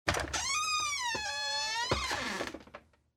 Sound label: Household Door Wood Door Squeak